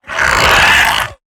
Web Sling; Lurker; Evolve; Gorgon

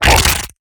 Exertion Light; Evolve; Gorgon

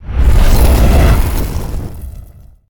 Flame Breath; Evolve; Meteor Goliath

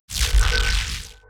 Glide; Evolve; Kraken

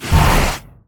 Vortex Blast Expire; Evolve; Kraken

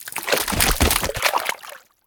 Explosion Flesh; Lurker; Evolve; Gorgon

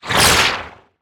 Teleporter Attack Slash; Evolve; Wraith

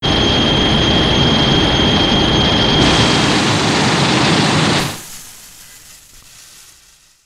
Heat Ray to Thermal Flame; Shin Godzilla

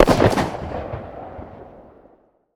Mimic Explosion Tail; Lurker; Evolve; Gorgon

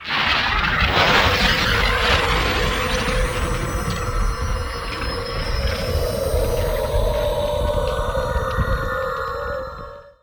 Teleporter Nebula Cloud Prepare; Evolve; Wraith

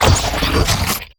Teleporter Warp Blast Explosion; Evolve; Wraith

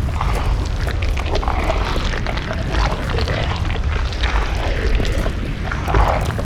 Tongue Grab Aim; Evolve; Behemoth

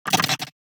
Emote; Evolve; Gorgon

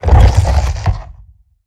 Roll Surface End; Evolve; Behemoth

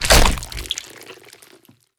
Tongue Grab Hit; Evolve; Behemoth

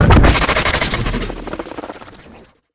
Ability Mimic Start; Lurker; Evolve; Gorgon

